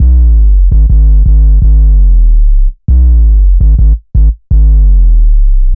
Hard Sweep Down, Bass Lines 166.5; Jungle Warfare 1&2

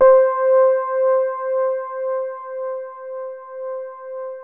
DELICATE, DX RHODES

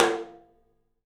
tom, studio toms; Percussives; 3D Percussives

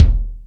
Lotsa Kicks, Drum Machines

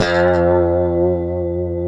F#2, Guitars; Spectrasonics; Hans Zimmer Guitars VOL-1; 90's Sample CDs; COMP POP+VIB

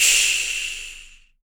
SHAKER, MALE PERC; Spectrasonics Vocal Planet; Jazz FX

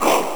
Voice HUU!; The Lion King; M8; Genesis

